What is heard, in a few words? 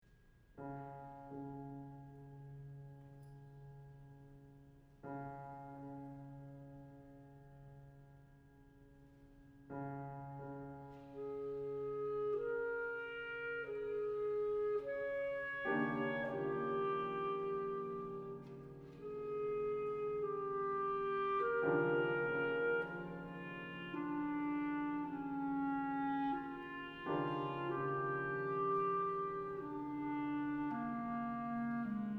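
clarinet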